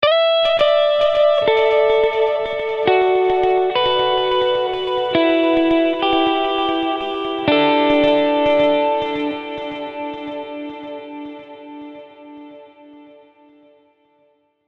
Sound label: guitar verse ornaments